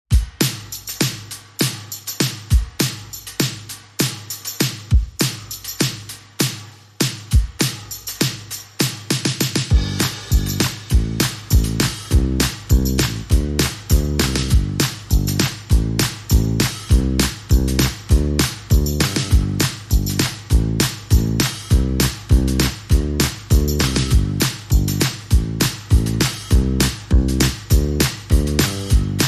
Drums and Bass

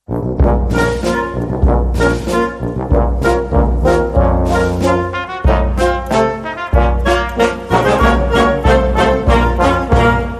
Jingle

